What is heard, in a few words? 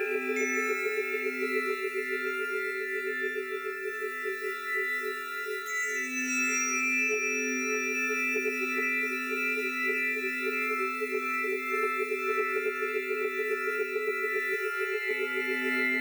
Atmos